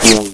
bolt fly, crossbow; hl2

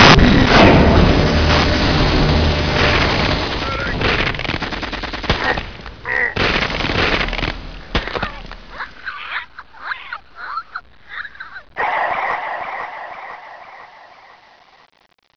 headcrabs

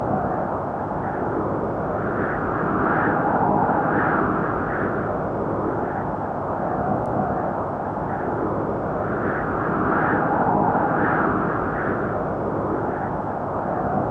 wind loop

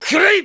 pain

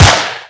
mp5, weapons

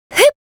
jump; ayaka

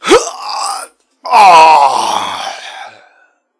death; doctor

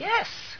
powersaw; nigel story

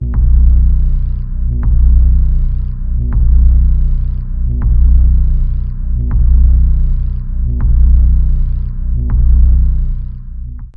pulse

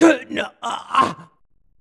death; trunks-fut

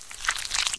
gun fidget sway, weapons; hgun

